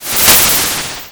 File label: Cry